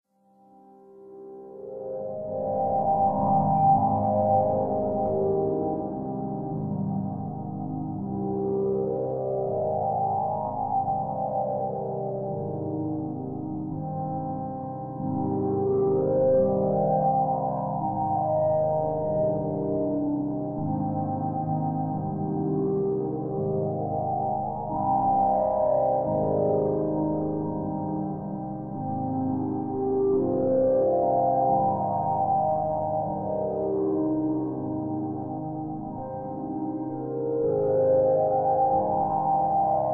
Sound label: Slaughterhouse; habitacion101; representacion